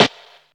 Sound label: fat steel snare drum f sharp key